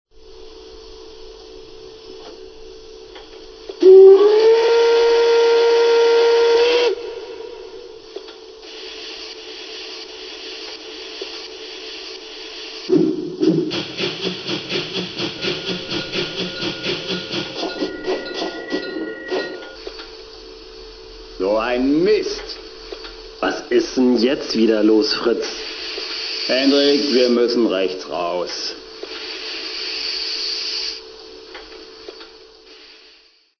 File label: BR44